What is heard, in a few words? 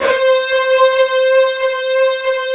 big disto; East West - Global Sounds